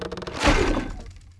Catapult Strike; Civ4 warlords

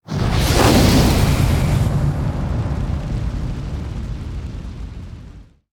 damage vehicle; World Of Warships

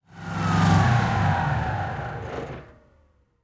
tank engine int stop; War Thunder

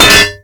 dest hydrant land

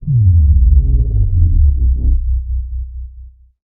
damage vehicle; World Of Warships

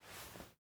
ironsights down; Rust

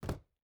footstep barefoot wood jump land; Rust

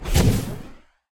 depth bomb; World Of Warships

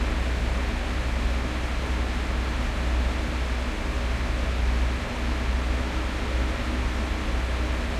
maus engine int rpm, engines tanks; War Thunder

